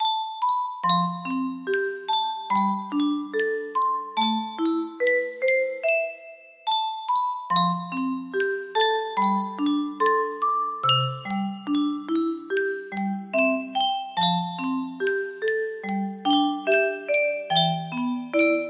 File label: melody